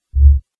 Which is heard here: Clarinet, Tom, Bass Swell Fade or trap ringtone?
Bass Swell Fade